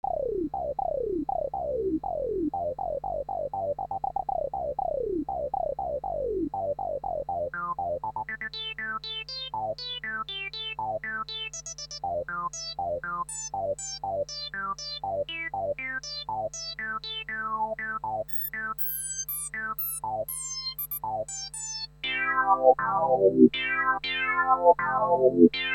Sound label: E-mu ESI-2000 filter, lpf q100